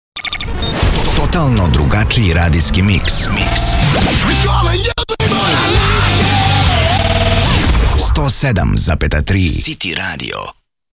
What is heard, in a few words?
city radio id jingle